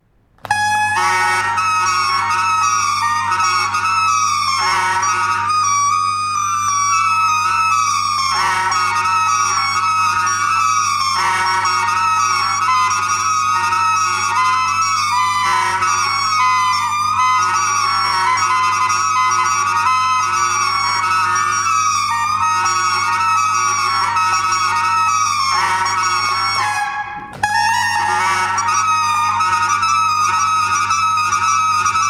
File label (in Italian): corno di rame